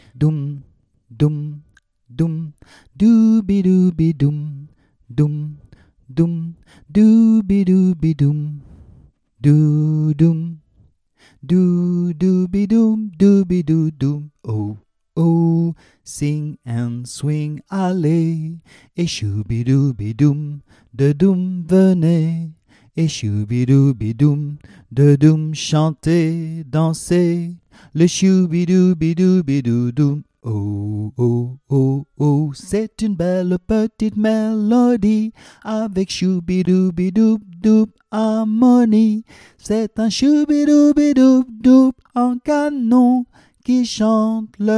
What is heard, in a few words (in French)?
Sing and swing voix solo